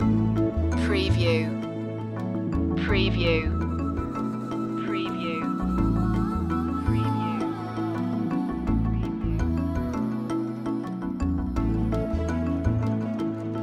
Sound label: paradise breeze loop